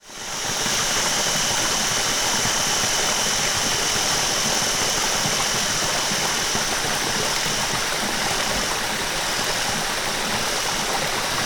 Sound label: nature ambience rainforest stream waterfall small australia insects day